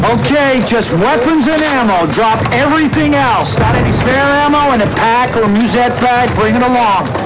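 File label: winter's out; dod; parahag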